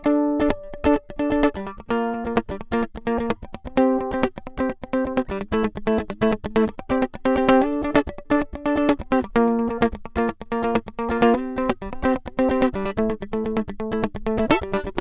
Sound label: mandolin comparision dual more chords